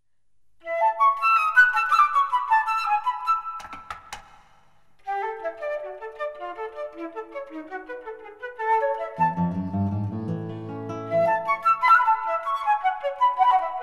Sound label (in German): Tango